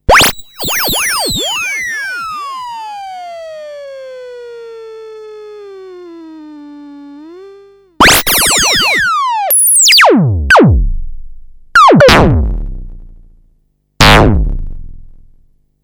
zap

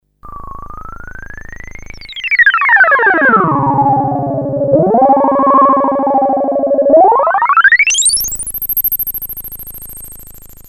switch

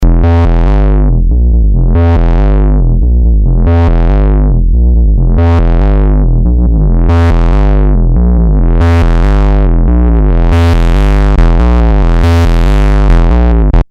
load bass

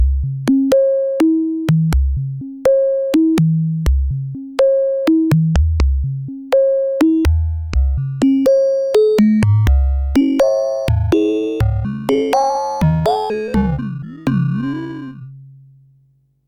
Perc Bell

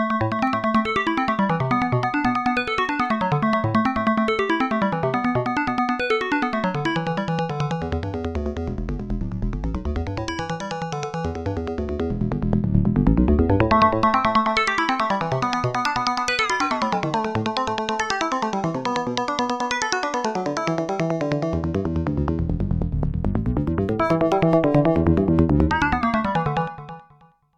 Morph Piano

